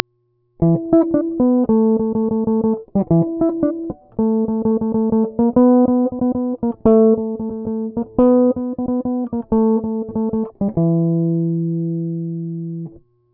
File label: bass groove dry; autowah